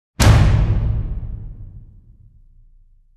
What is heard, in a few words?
Evil Hit; Gothic Storm Music